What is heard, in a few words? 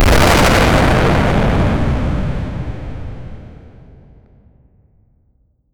a loud explosion from a